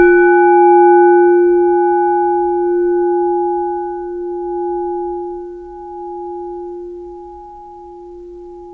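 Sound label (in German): Kl Zen F, gegossen Klangschalen; Klangproben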